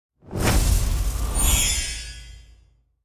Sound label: ui king match win